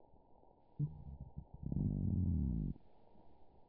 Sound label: Nassau Grouper Estriatus